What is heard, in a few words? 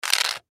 Remote Control Slide; Technology; Slides; Objects; Blastwave